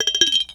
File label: JAR CLINKE; Rarefaction; Poke in The Ear With A Sharp Stick